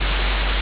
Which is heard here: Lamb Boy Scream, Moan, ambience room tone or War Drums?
ambience room tone